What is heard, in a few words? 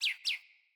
Bird Double Chirp; Josalfar Bird Guide